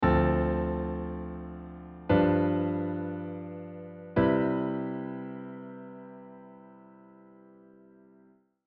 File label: voice leading